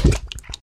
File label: drip